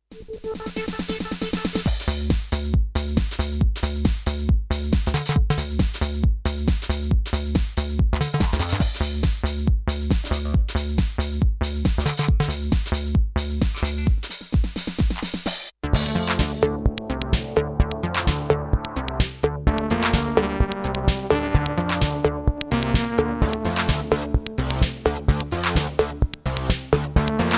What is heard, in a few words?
M-Audio Venom